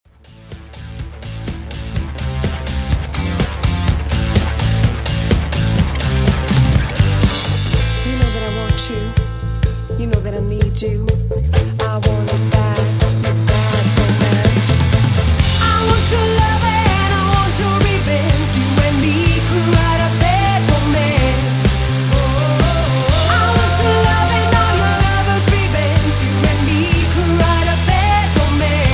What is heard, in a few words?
super bass